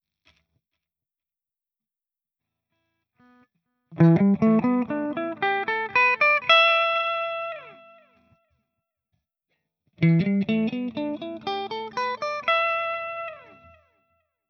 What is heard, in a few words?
Jackson clean